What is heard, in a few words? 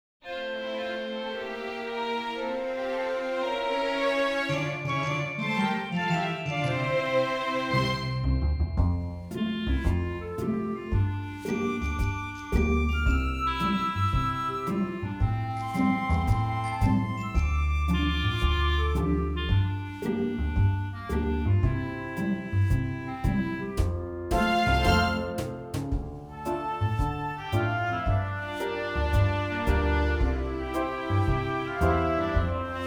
Dream Song Instrumental